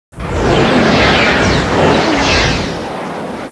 attack; Babylon 5 - Entertainment Utility

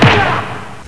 beat

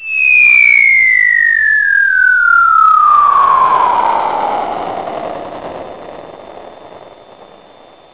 Meteorite; JumpingAround

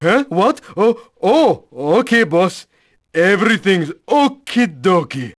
Wave test; PC Gamer IT CD 30; QFG5DEMO

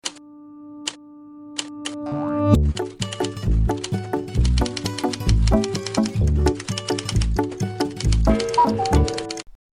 jingle; MOBICLIC136

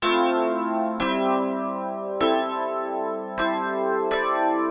Synt

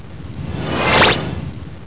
Teleport; DOOM2

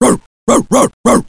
bark

